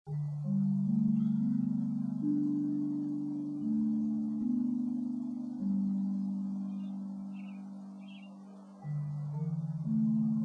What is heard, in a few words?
Bass Octave Solo